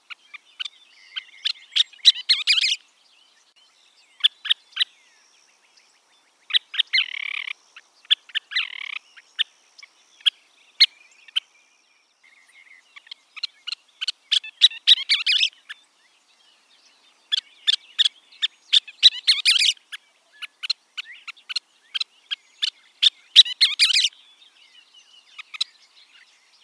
Scissor tailed Flycatcher

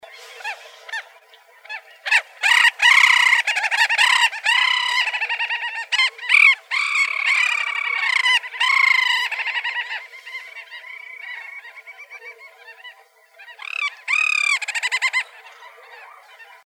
Dovekie Alle alle

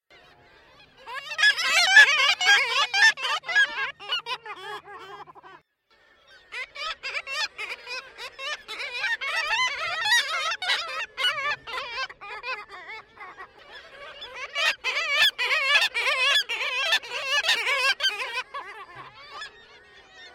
Black legged Kittiwake

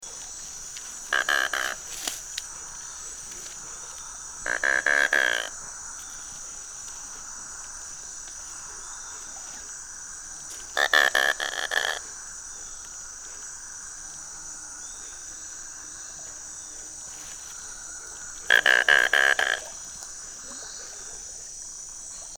Anhinga, Anhinga anhinga

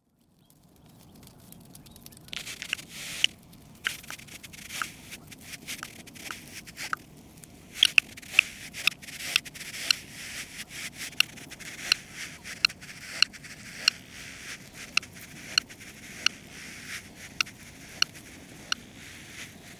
Buff breasted Sandpiper

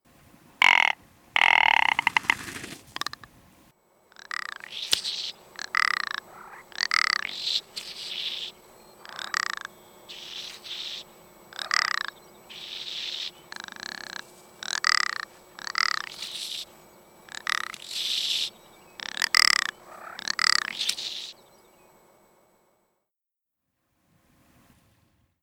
Rock Ptarmigan

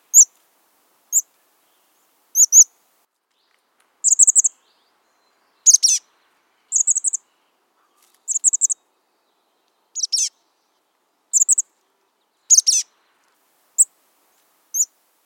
Chestnut backed Chickadee

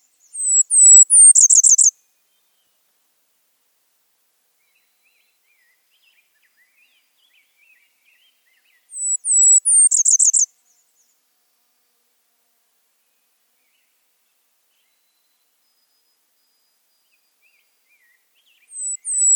Blackburnian Warbler

